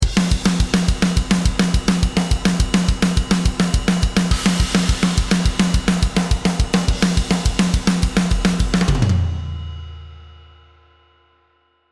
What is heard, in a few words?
Thrash Metal Groove 2 - Natural Wet, drums